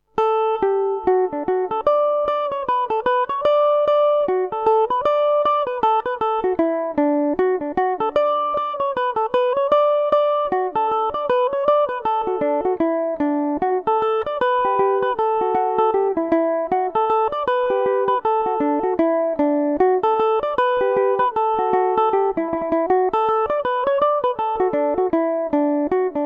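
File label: D major revelation, Irish mandolin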